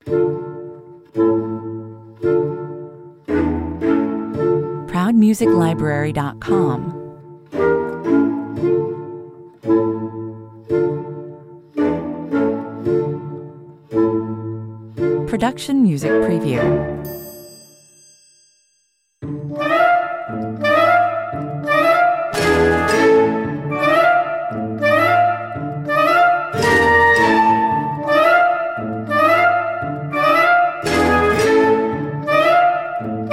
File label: crazy house 75 bpm